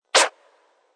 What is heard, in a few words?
Single record scratch effect.; SFX DJ Record Scratch 8; Musical